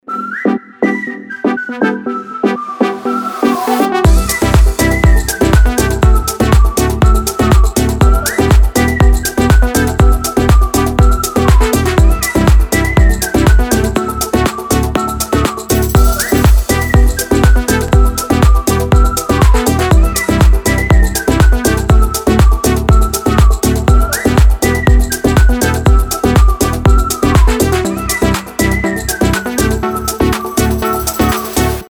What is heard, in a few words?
whistle song